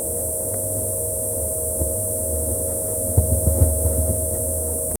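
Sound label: wind howling past buildings; fabric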